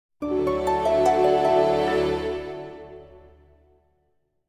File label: Eye Catch Romantic